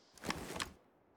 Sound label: weap sn ads up; reloads; gmod